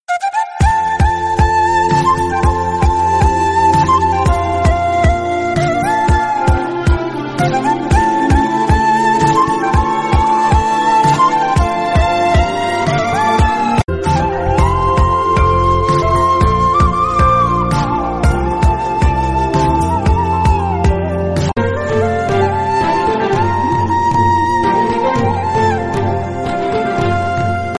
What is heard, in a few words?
flute ringtone; malayalam ringtones